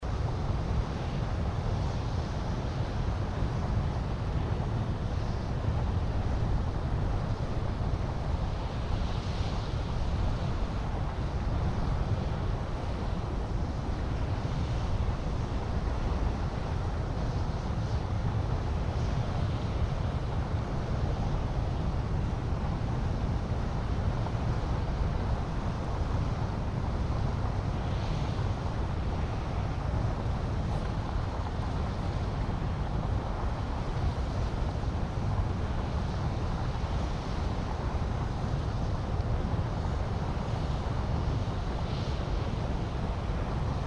Norris Geyser Basin Porcelain Basin Overlooking Hurricane Vent Binaural